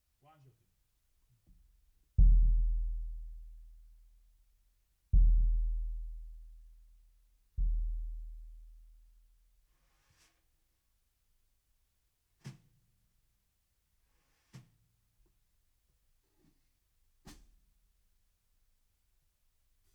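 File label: BD Out, bass drum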